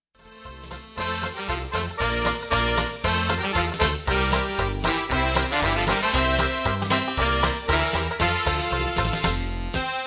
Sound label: Button Box Medley